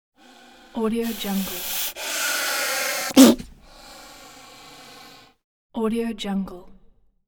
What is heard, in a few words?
gaboon viper snake; hollywoodedge